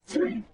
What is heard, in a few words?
foomp